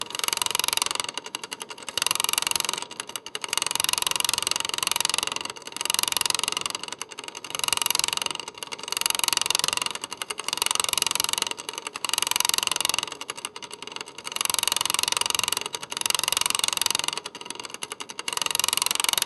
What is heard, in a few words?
asmr machine repair sound; mechanical clicks